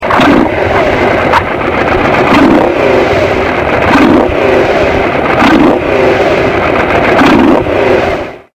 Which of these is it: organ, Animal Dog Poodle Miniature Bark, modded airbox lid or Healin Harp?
modded airbox lid